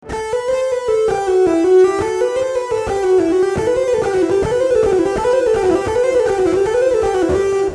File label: legato